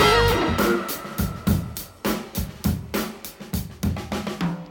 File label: BREAKS